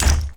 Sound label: undine move; Akeron